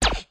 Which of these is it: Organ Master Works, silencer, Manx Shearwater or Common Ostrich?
silencer